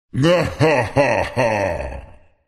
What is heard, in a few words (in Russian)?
zloy smeh velikana